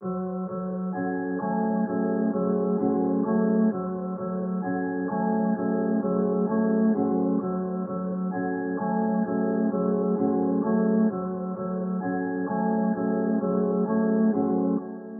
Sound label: 130 BPM